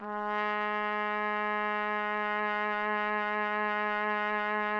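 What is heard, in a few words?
TPT, trumpet; Roland - LA Composer vol. 3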